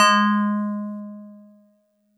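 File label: StarPiano DX100 G#6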